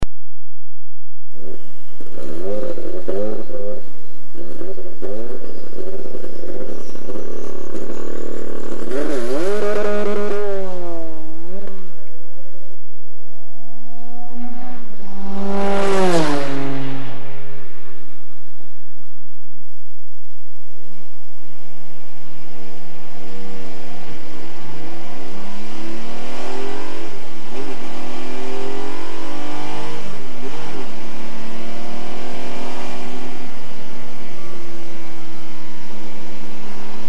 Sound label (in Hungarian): Porsche 962, motorhangok; autosport